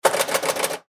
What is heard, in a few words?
Pinball Flippers; Press Fast Multiple; Arcades; Sports; Blastwave